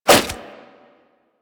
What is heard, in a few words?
Nunchuck End Whoosh; Warframe; Infested